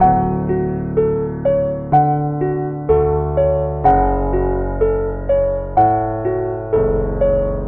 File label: piano, Loops, Sad